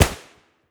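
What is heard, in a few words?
smg stereo, fire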